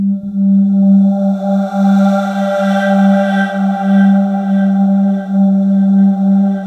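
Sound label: atmosphere; Sound Gallery - Charged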